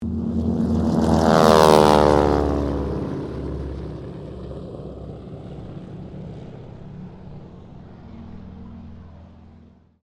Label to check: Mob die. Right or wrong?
Wrong